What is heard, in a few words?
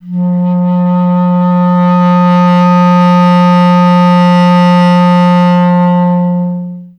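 wind bass clarinet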